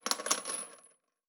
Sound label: Chain; Fantasy Sounds Bundle; Craft